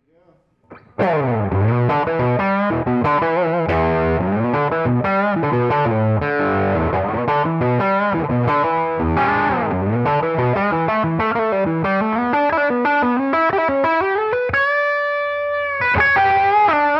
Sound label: 1965 Gibson Trini Lopez Deluxe; played by Jared James Nichols; Gibson Trini Lopez Custom